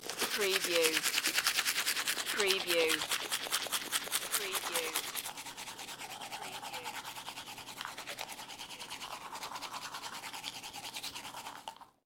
Brushing Teeth, Bright Open Sound, Brushing Front Teeth